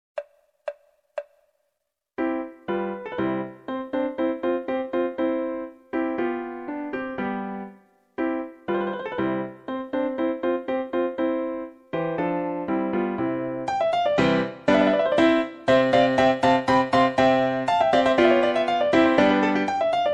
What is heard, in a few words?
ob konzert, oboe; オーボエ用伴奏; mozart